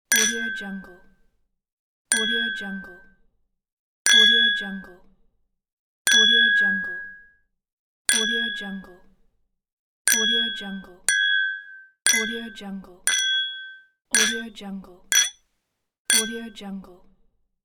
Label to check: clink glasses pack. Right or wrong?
Right